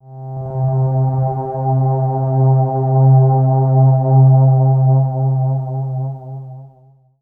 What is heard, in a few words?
Ambient Pad C2; Trance Formation